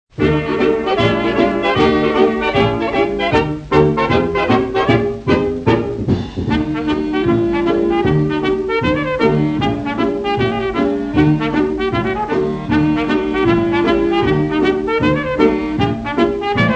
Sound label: schottische